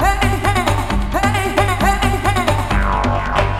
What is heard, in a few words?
MOVE LOOP, Techno Trance; E-MU Formula 4000 Series Vol. 2